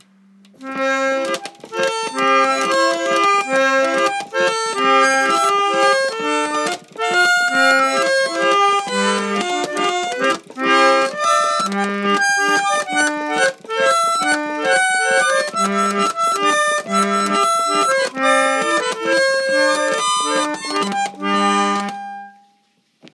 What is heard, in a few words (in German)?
kleine serinette; drehorgeltag 2018